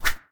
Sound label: knife throw